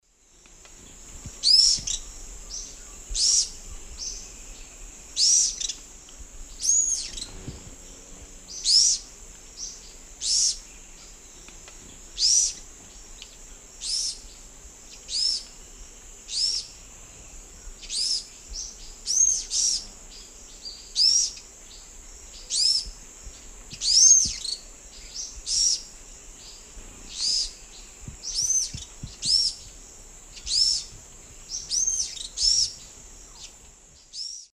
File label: Tolmomyias traylori, 2 call types; S. America; Eastern Lowlands